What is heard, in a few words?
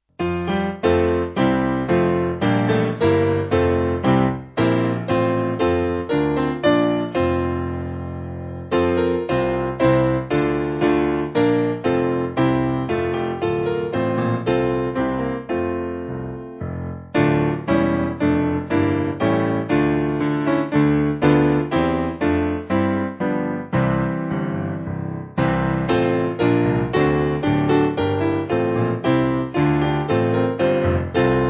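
Piano, church music backing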